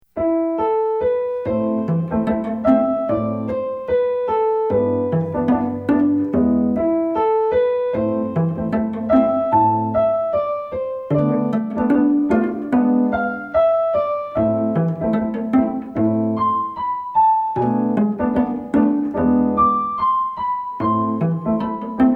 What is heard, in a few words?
pizz